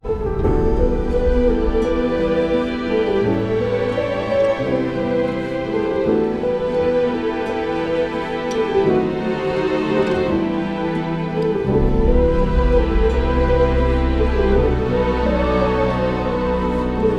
Emotional BGM; Court Movie

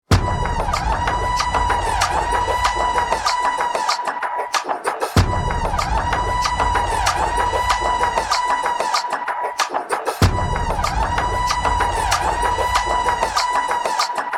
Trailer BGM Mix; bollywood

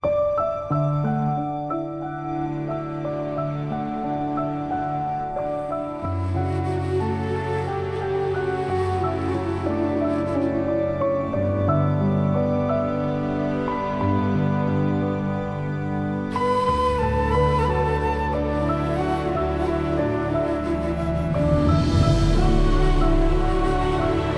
Emotional BGM; Peddi

